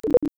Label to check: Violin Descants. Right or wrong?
Wrong